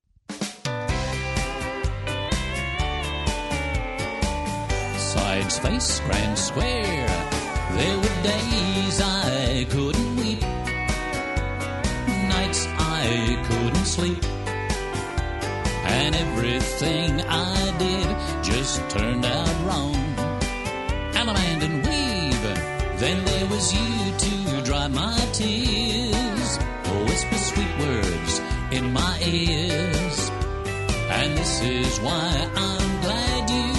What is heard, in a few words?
smile vocal; square dance music